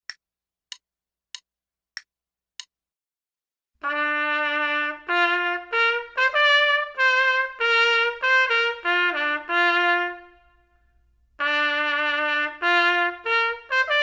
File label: trumpet 2, Menuet; metronome setting for this performance is quarter note = 72; Selected Duets for Trumpet, Page 12 Number 5, Menuet, performed by David Summer.; Trumpet 2 Only